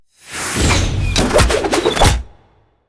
archeology event trap arrows; ancient relics

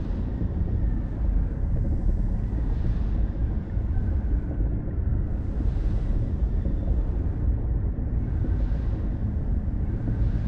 juggernaut idle; aquatics

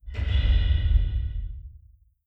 distance dimensional horror tentacle attack; Stellaris; guardians

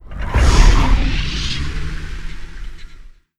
sfx destroyer moving out; Stellaris; nemesis

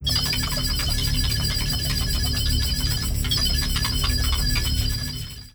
event administrative work; Stellaris

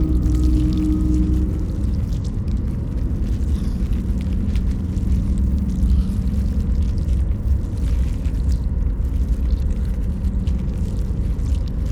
ant ship starbase idle; biogenesis

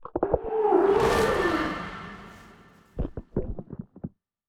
aqudragon reanimated attack; Stellaris

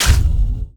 space amoeba fire; weapons; Stellaris

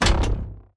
collection locked; Stellaris; grand archive

